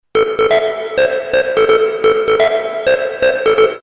Electra Ring

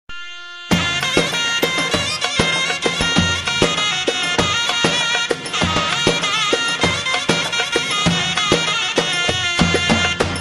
Zurnaa

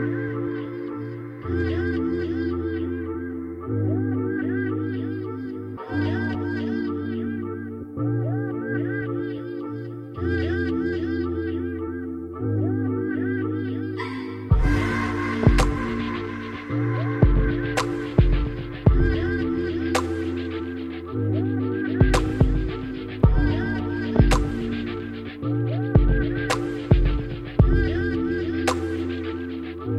Chillhop Groove

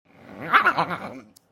Odd laugh

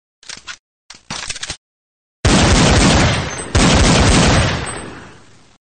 Gun Shot Sound

